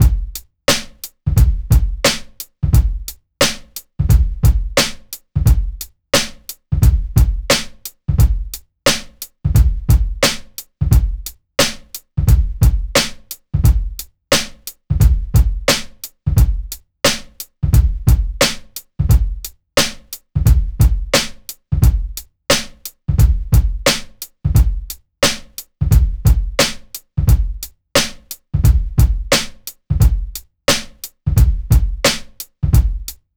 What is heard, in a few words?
88 Tite Click Bounce, Breaks; Lockdown Breaks